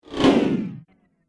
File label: Rippled Downward Woosh; Whoosh Sound Effects; Andrew Fleming; Twistedtracks